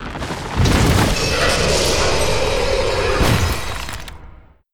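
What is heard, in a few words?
ice lord death; monster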